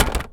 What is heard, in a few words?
Rattle; BB Retail Therapy Sample Pack